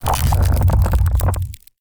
entangle outro, vines; 1st level spells

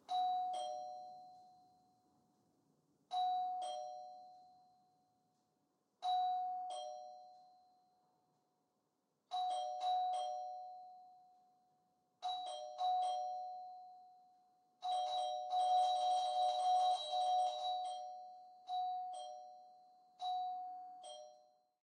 int door bell low tone distance stereo; sonniss gdc

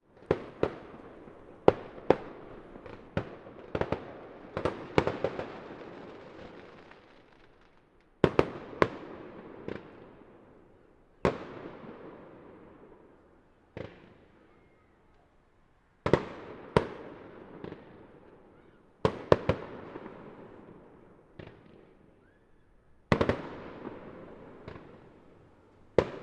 fireworks display multi; Sonniss GDC

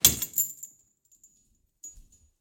metal chain hit; sonniss gdc

